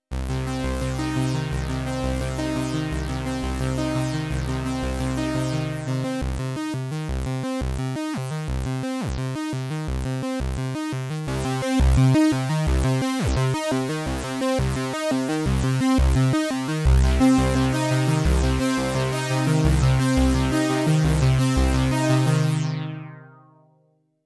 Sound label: Modal 008 Keyboard Modes